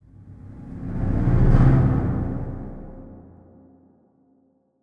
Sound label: cave, ambient; minecraft; SMCNV-pack